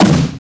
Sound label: PUNCH